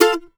MANDO F MAJ R; Sampleheads; Whole Lotta Country 1 & 2

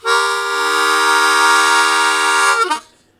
Blues Chord Attack Eb1, Harmonica; 90 s Sample CDs; Zero G

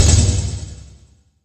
C1, Synth Leads

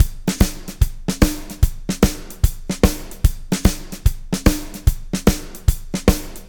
POWR, Backbeat, SHUF

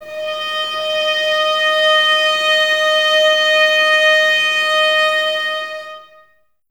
STR VAS D#, Violas, No Vib; Miroslav Vitous

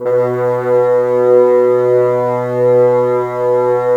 Bassoons B; Miroslav Vitous - Woodwind Brass Ensemble; Bassoons Links

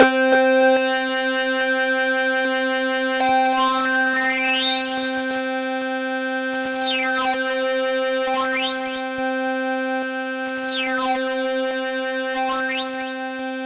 C4, SynthLine

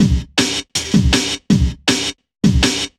Hashstomp Beat, Drum N Bass; Sonic Foundry (Sony Creative Software); Knutrix Drum & Bass

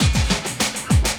loop, 200 BPM; Ueberschall; Drum & Bass Resonance

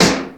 SHOT-GUN RIM

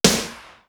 TORQUED, TOM; East West - Global Sounds; D4 BD SD TOM

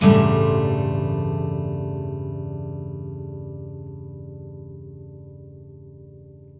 Blues Guitar, BARI CHORDS; ILIO; Fretworks

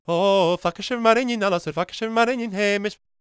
GAELIC, World Voices; ILIO; Vocal Planet

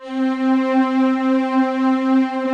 C4 SLO LO, KEYBOARD; AKAI S6000 CD-ROM - Volume 1; Piano

